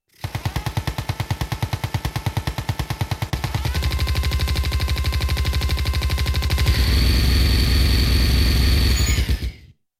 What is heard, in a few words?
Gattling Cannon